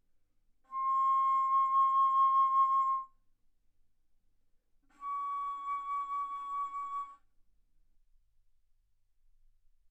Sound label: Bass Flute C6 Db6; ff